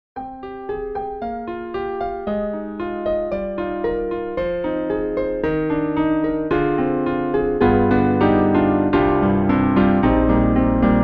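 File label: Broken Piano Old Upright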